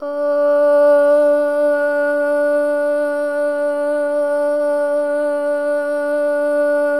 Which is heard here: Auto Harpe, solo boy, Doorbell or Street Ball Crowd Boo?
solo boy